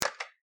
Tape Button Stop

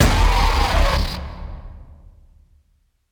Scream; Terror Squid

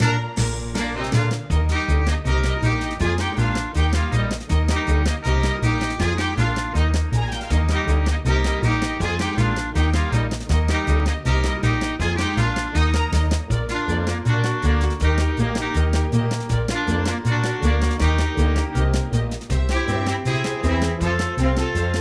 Tea Cup Switch; Toy Story Mania

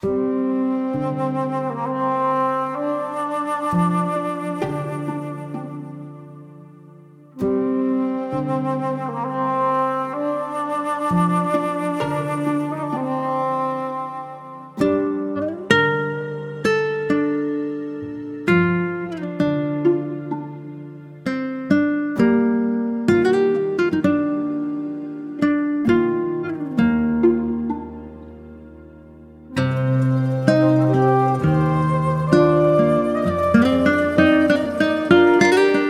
Forest Level Start Deep; Death's Door; PC, Switch

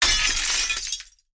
glass break

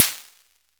Snaredrum; rainbow laser disco dungeon

